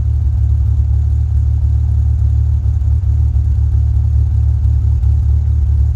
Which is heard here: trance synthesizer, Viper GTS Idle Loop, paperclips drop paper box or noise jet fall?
Viper GTS Idle Loop